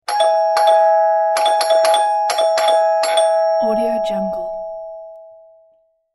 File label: ding dong doorbell rings